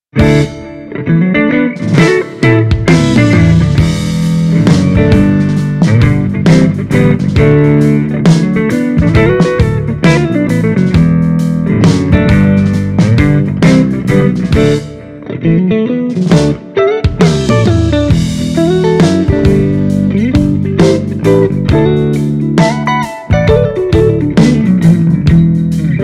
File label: Mini Rox Mic Inside CANNABIS REX Stratocaster